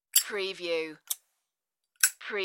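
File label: Open Lighter Close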